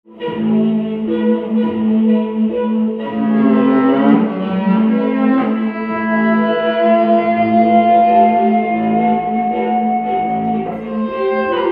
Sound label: Mostly Sax